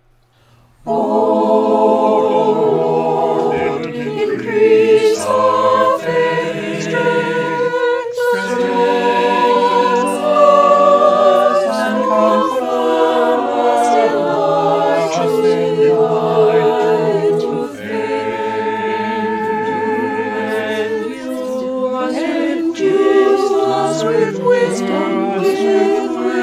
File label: Virtual Choir; Loosemore; O Lord Increase our Faith